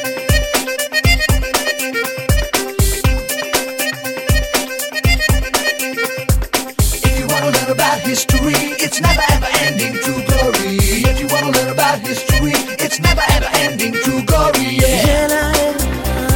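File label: Happy background music Telugu Ringtone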